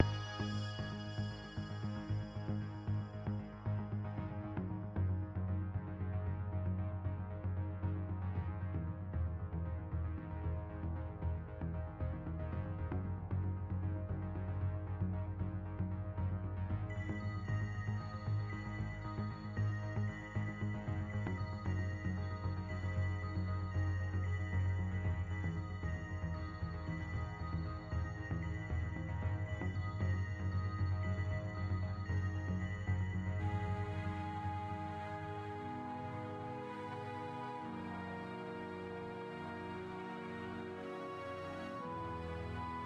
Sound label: Forest Pause; Metaverse Keeper